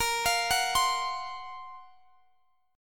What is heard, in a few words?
F sharp Major 7th Flat 5th A sharp